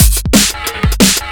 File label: Speed loop, Drums Beats